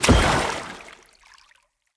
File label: Blunt Heavy Impact Water, Weapon Impacts; Player; Environment